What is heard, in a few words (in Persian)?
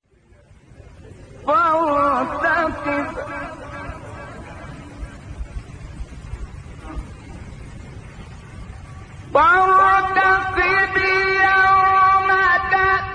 تلاوت زیبای آیات تا سوره دخان; شحات محمد انور